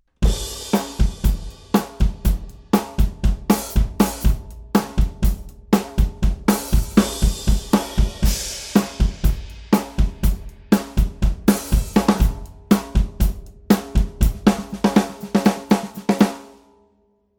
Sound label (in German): Manic Maple mitteltief kit, snares; manic drum custom; Manic drum custom und series snares